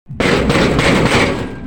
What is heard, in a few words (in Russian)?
zhestkij stuk v metallicheskuyu dver